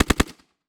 Weapon Sub Machine Gun World War II Historical Short Burst; AdobeCC